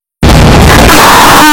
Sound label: car wreck